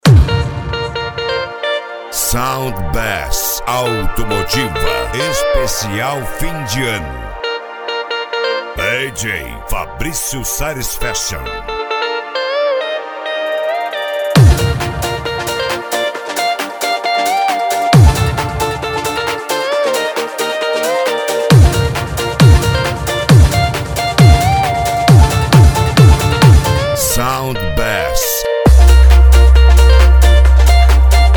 sound bass automotiva; uba mg

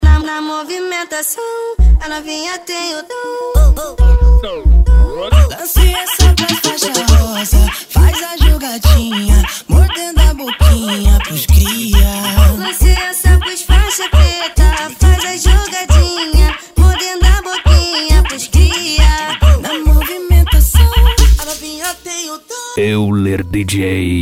funk ritmado; cuiaba mt

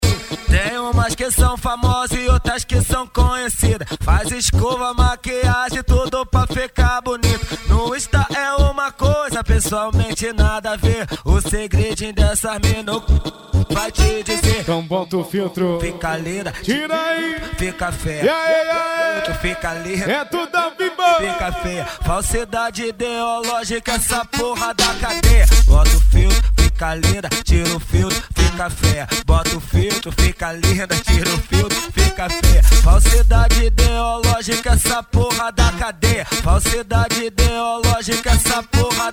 funk pisadinha sertanejo

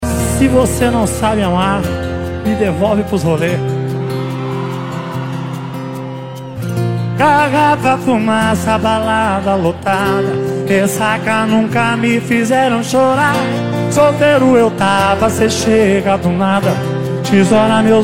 funk e sertanejo

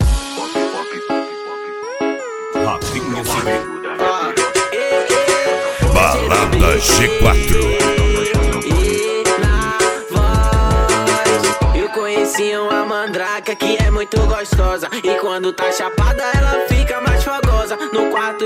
brega funk; putaria 2020

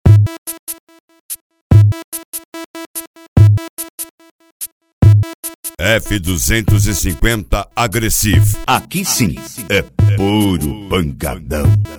eletro house pancadao; f 250 agressive vol6

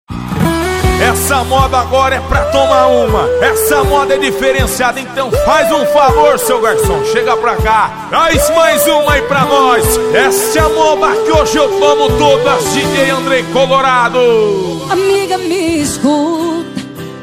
sertanejo com locutores de rodeio

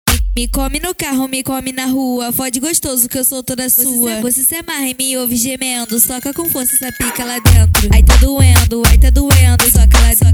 sound car; reboque poseidon sartori

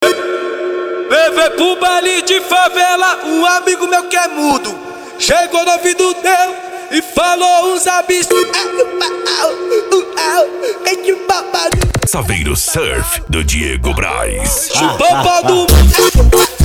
funk consciente e funk ritmado

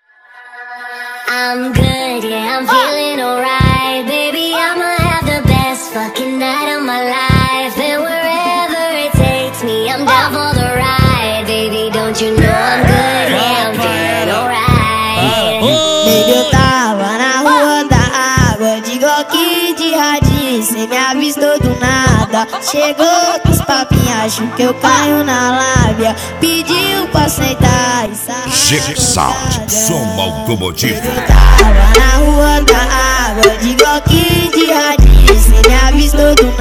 som automotivo

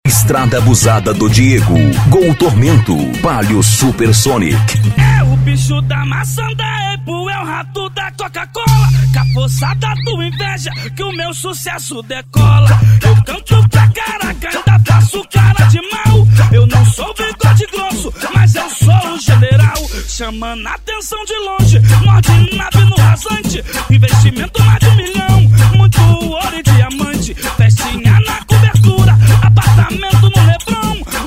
funk bass e pancadao; itaposaund 2015

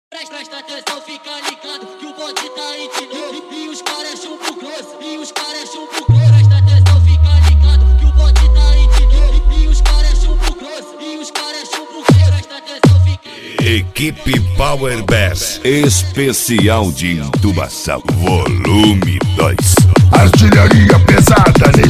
entubacao

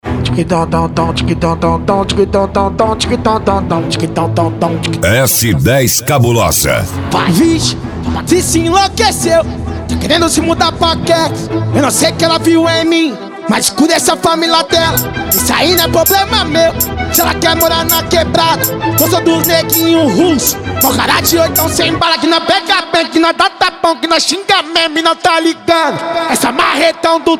funk comercial

